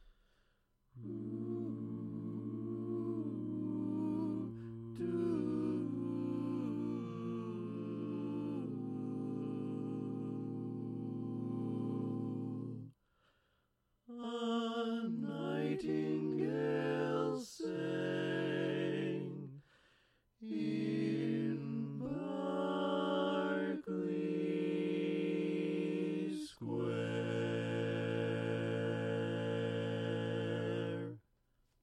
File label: Lead, barbershop